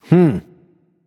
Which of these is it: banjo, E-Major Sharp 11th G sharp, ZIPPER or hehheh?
hehheh